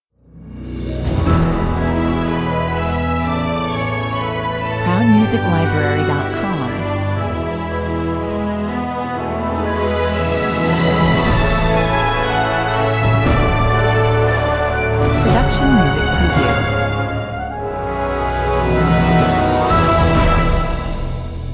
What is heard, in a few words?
logo, 140 bpm